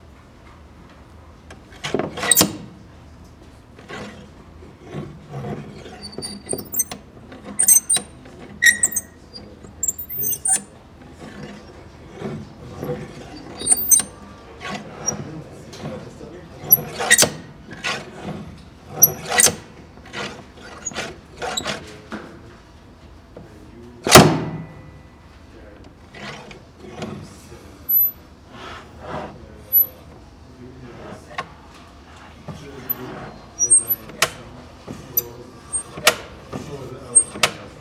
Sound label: paper chopper